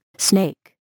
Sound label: snake